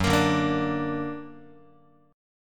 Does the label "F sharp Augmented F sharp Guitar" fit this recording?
Yes